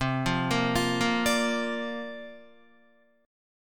C 9th Suspended 4th C down Guitar 4F, 4A, 5C, 5G, 5C, 6E; C9sus4 arpeggiated